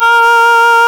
REED A#; Big Fish Audio; Synclav FM

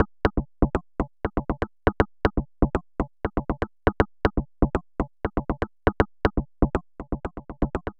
Bongo Simple Beat, Electronic Beats; Sonic Foundry (Sony Creative Software); Myths of Technology

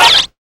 HELMET SOUND, Science Fiction; Mower Man

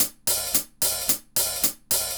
Hi-Hat Groove; Sonic Foundry (Sony Creative Software); Vital Drums

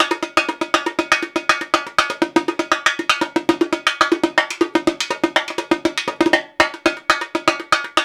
120 Rio Batucada

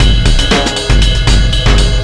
Beat, Drums, Eclectic Electronica

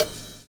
FAT STAB; Univers Sons - Kitch 70s

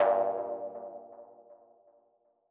Mono DBeat G2; KG MegaSaur

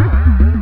WAVEDRUM; Back In Time Records; Big Bang

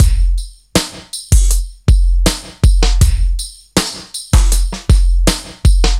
160, Drum Loops; Total Drum&Bass

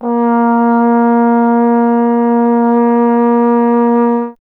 Trombone A#; Miroslav Vitous - Solo Instruments VOL-1

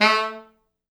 A2, Pop Brass; Best Service ProSamples vol.19; AKAI

